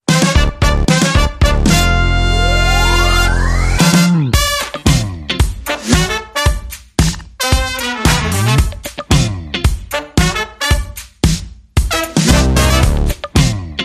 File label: No Vocal Shouts and Synth